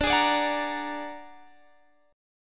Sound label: Bb Augmented 7th D